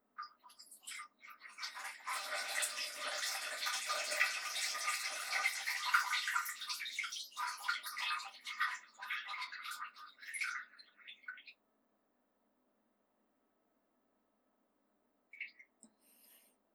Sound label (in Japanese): おしっこ トイレ ステレオ